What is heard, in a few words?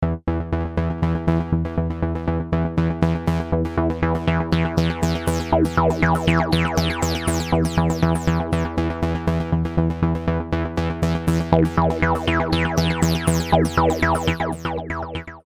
Gated Arp